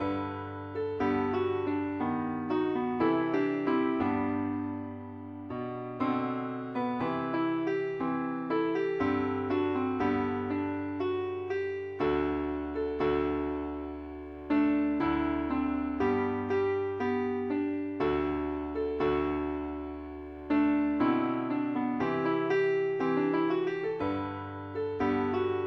keyboard only, key g; pilot

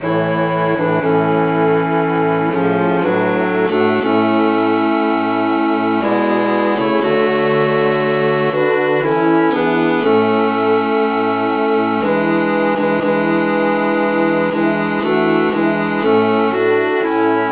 traditional key D

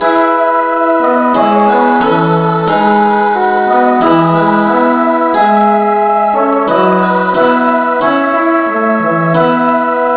key E, no vocal; christe Sanctorum

